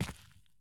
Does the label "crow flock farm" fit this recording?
No